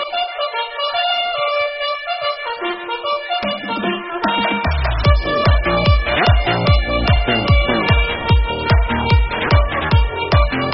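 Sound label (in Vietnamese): Nhạc báo thức quân đội remix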